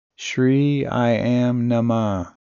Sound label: mantra